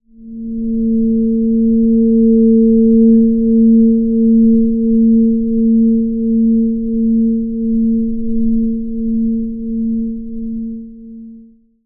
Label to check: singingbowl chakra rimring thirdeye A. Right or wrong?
Right